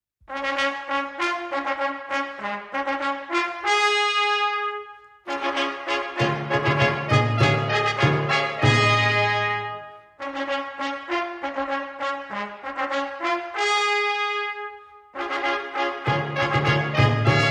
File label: Maestoso; Stelzentanz